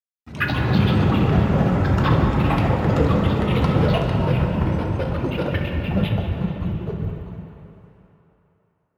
Carrier Idle

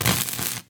Stun Baton

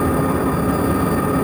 rapid Fissile Accelerator Start Loop; WEAPONS

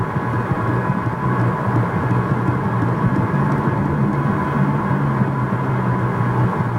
Oxygen Generator

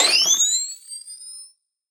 harpoon Coil Rifle Charge Up; WEAPON

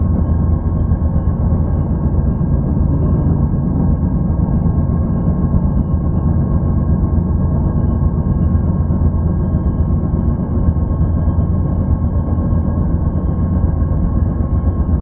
Reactor Track

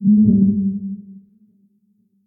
MOLOCH damage

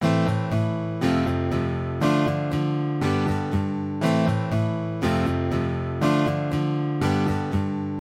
Guitar

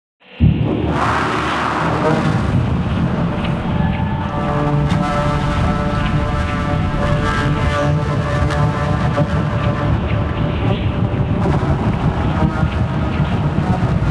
collapse; JOVE

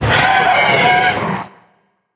HUSKED CRAWLER attack

